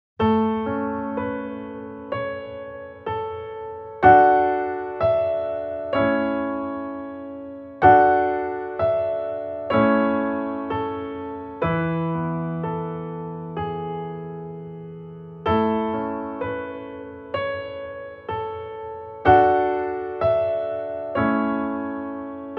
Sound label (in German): Wenn du jetzt gleich schlafen gehst instrumental